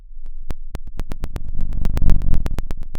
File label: pop, Sound of a fire crackling